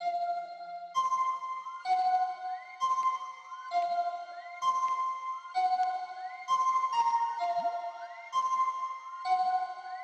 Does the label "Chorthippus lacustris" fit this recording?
No